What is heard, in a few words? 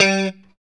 Pop G 3, Strat Pop